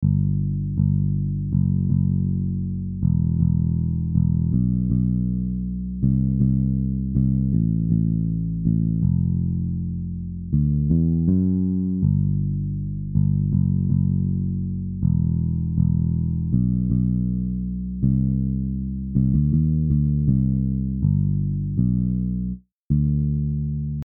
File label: bass